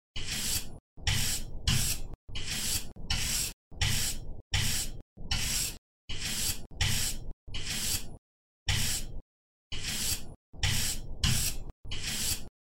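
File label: brush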